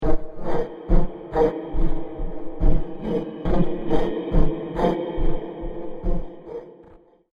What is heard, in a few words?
Space Miners Of Mars, science fiction sound effect